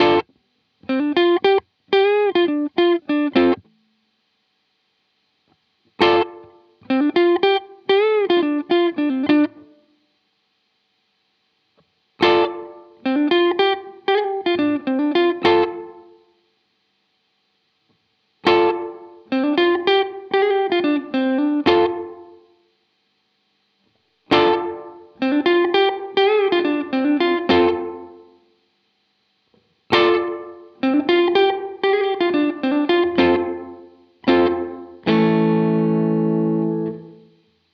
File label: Walrus Audio ACS1 Room Ambience; Fullerton